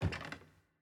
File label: Footstep Basement Wood